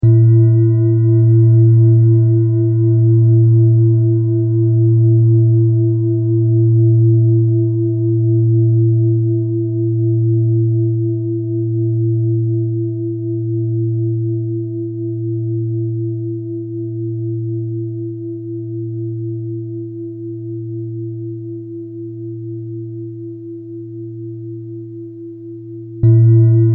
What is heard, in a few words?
Mindfulness Bell, meditation music; guided mindfulness meditation